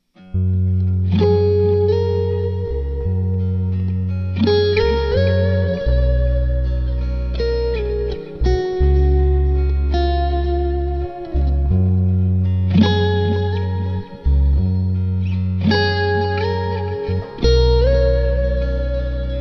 strat kinmans

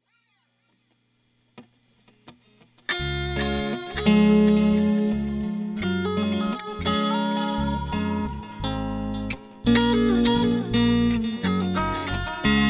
strat

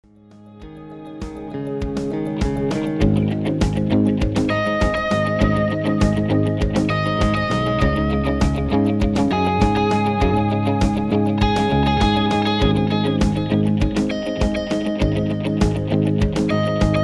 Bad with Korg A3 mod delay

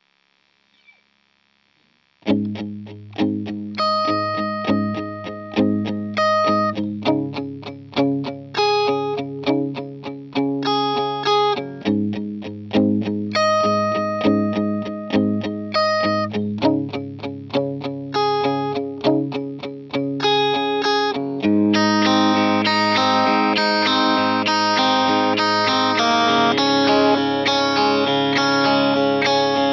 Dry no delay

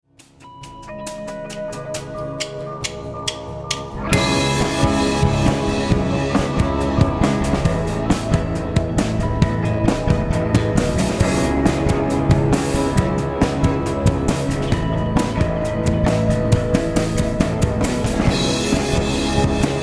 Axefx

